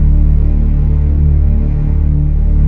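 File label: synth ethnic, dance synth; Sound & Vision - Gigapack I CD 2 (Roland)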